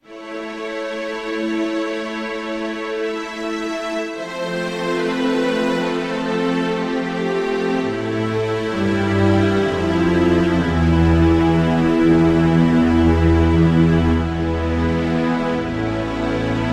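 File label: Grand Legato Strings; Combi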